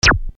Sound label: syntetic zap